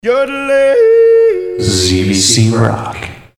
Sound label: yodel